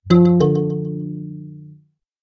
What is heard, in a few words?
anybody home; wake-up tones